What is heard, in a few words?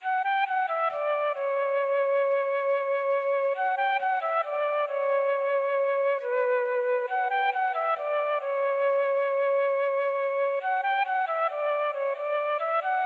Flute 136bpm Melody C m